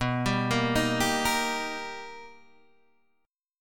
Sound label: B Augmented Major 7th B Guitar DADGAD, chord